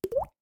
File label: Plop, notifications; Yota Yotaphone-2